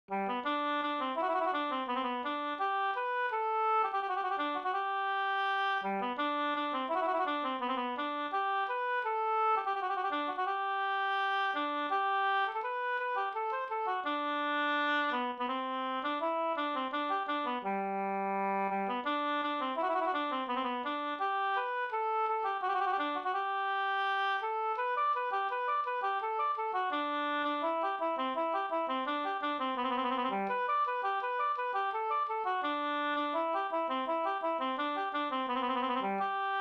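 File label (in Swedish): skanklat med vistext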